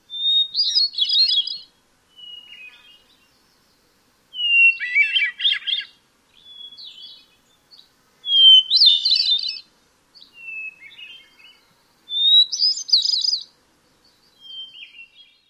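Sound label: Hermit Thrush, song number 2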